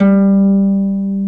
HARP KARPLUS

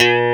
CLEAN GUIT; Greytsounds; Scott Peers Sound Engineering

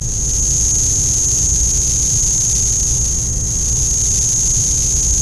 FAILING BULB, BUZZ HUMZ; Spectrasonic Distorted Reality 1

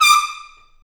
BRS TP, Roland, Stabs; Roland L-CDX-03

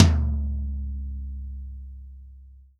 REAL DRUMS POP KIT

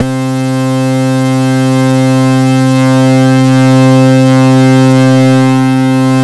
ACID CUT BASS; Masterbits; Vintage Voltage

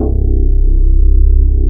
STRIA, Twisted Textures; STRYNGI

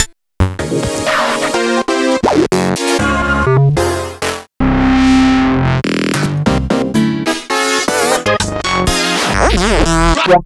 Synth Sounds

